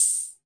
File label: Roland RHY55, DRUM MACHINES